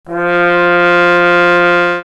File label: Sordine f3, horn; dsp2008 sound library